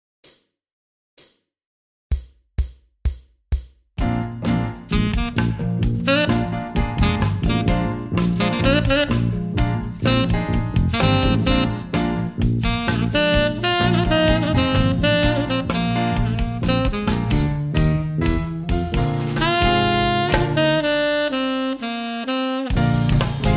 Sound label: sample solo rock tenor sax